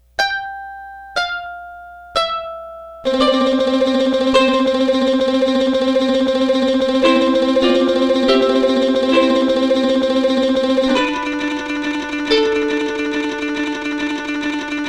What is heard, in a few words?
TREMOLO MANDOLIN MANDOLIN